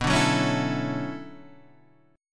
B Minor 11th B down

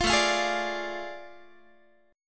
A Major 7th Suspended 4th Sharp 5th, Guitar; AM7sus4#5; strummed; 20,17,20,18,18,x

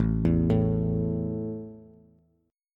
A 5th A down Bass; arpeggiated

